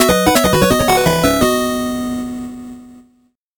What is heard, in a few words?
8bit jingle fast